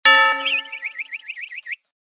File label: funny cartoon sound effects dazzle hit and birds